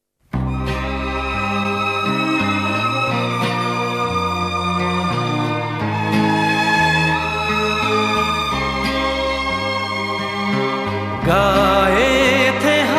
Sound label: (Sad Version); Khiladi